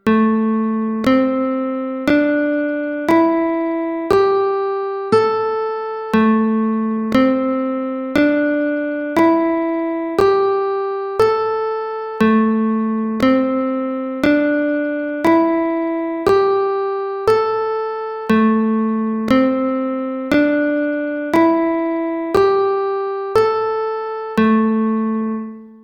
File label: A Pentatonic Minor scale